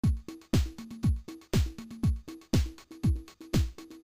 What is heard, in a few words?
loop